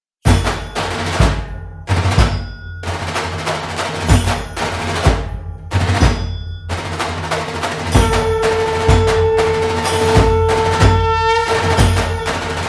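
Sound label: background music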